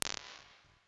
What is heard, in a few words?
Room, Chambers and Large Rooms; Ableton; Convolution Reverb